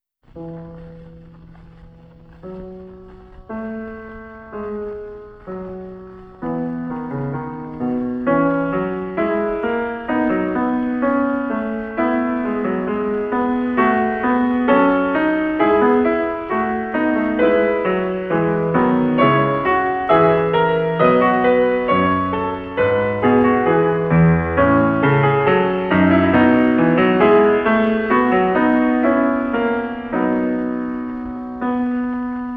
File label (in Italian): Clavicembalo ben temperato Fugue No 9 in E major; J S Bach